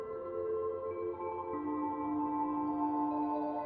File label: eurorack